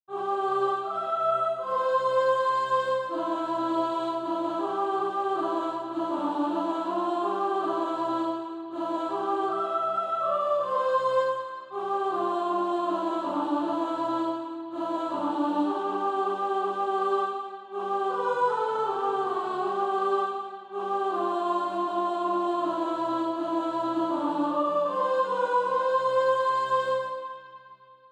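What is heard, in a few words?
soprano, Practise Music; SATB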